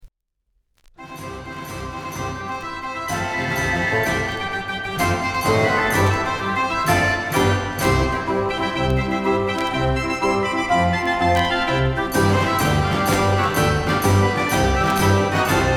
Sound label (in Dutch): draaiorgel; de turk kristall